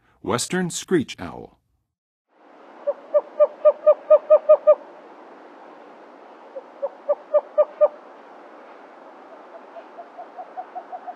Western Screech-Owl, Songs of Birds of North America; Western USA; Western USA Songbirds